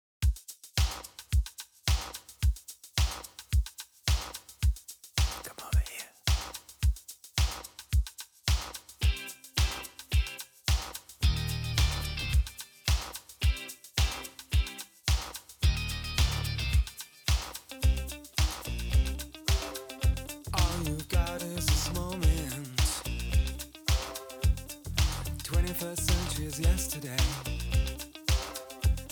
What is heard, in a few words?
Dolby Atmos Music